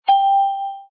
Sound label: count Down